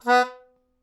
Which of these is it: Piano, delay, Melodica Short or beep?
Melodica Short